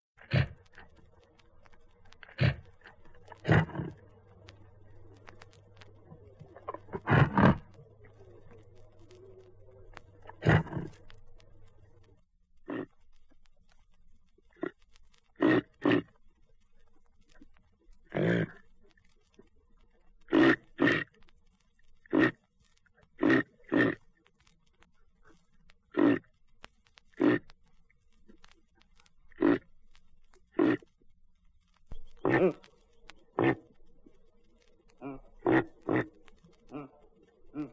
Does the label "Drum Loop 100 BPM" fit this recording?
No